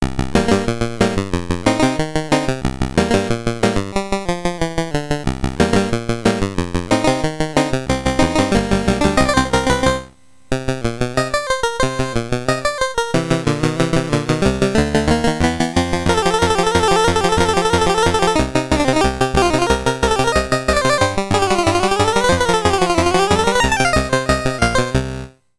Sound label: Piano Tune; commodore 64; maniac mansion